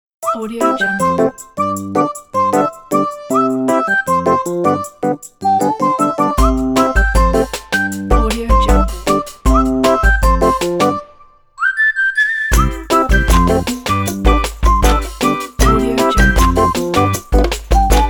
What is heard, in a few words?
comedy intro logo pack